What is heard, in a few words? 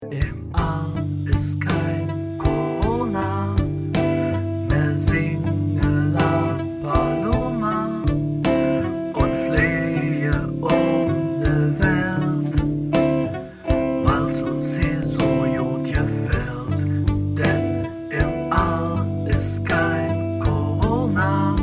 Jingle; Oklahoma